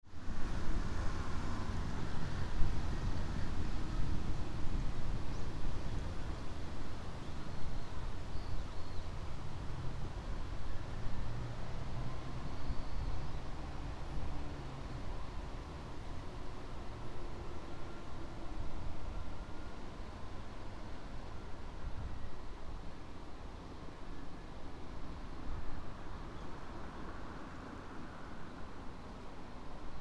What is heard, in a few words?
shinobu park windy